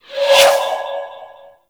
Spirit Primary Fire Whizzby; Warframe; Grineer; Thumper Bot; Sentient Spirits

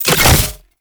Fuel Projectile Drop; Warframe; Jade Shadows; Ascension

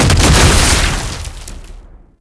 Suicide Scuttler Explosion; Enemies; Gameplay; Boss; Warframe; Oraxia

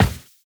Run Default, Footsteps; Warframe; Tenno

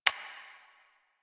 Computer Keyboard Stroke

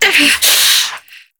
Pain Long; New War

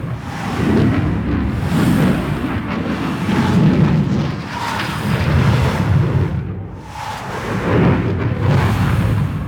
Hoverboard Wind Rush Loop; Warframe; Vehicles; Venus

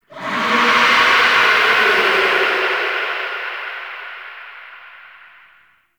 Sentient Use Ability Verb; Enemies; Warframe; Tau; Sentient Dactolyst

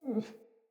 Wolf Cub Sleep Idle Yip; Vocals; Animals; Orengal Wolf Cub

